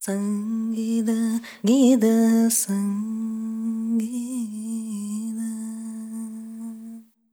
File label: ETHNO FEMALE, Vocals; ethno2